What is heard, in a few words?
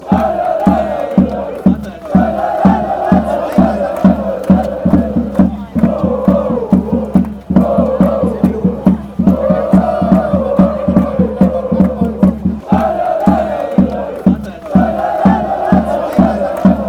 oooh, fanchants